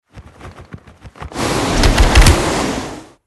attack; hero

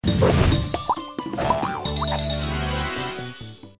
draw card one

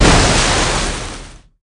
boom, battle

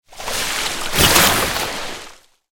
attack; hero

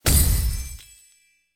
smash egg

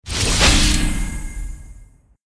skill buff

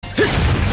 wind; battle

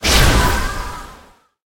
effect wind

